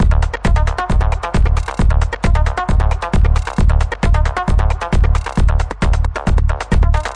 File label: 134 bpm, tweaky rolling acid hard kick, drum loops and breaks